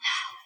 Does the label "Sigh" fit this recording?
Yes